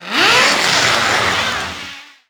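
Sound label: foley fast rope start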